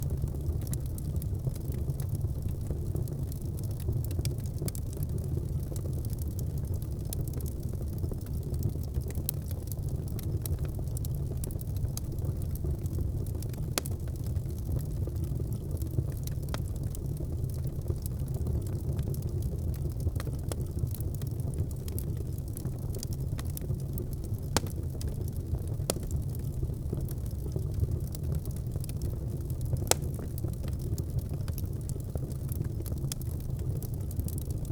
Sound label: sound of a fireplace whil, ambiance